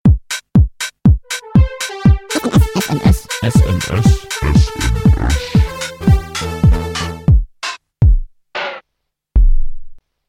Hip Hop Sms

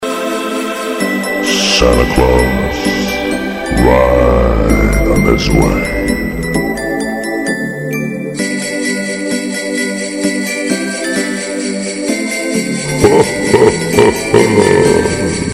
jingle bells

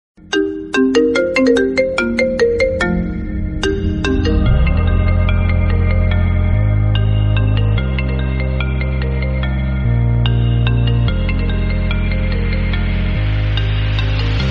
iPhone XR Volumn, dzwonki na telefon

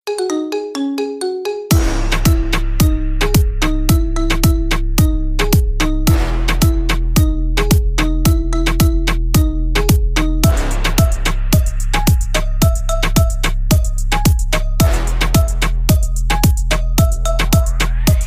Marimba Remix; dzwonki na telefon

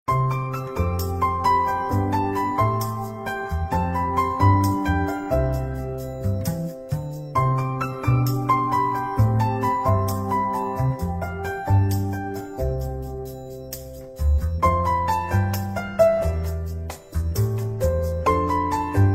crossing a river samsung

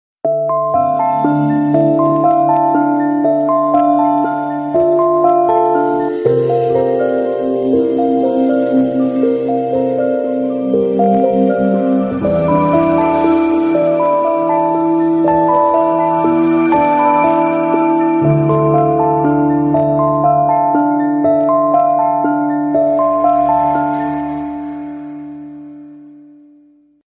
Nokia Classic Silvery Bright

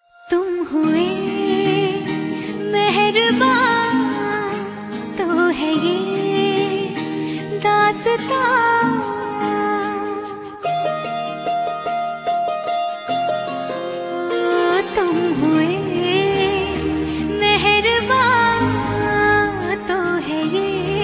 Instrumental

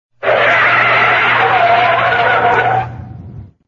Car Tires

